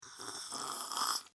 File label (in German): schluerfen